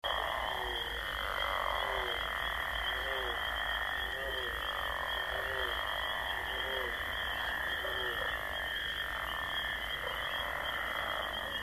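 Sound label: Ambience Swamp Frogs Lake Night Loop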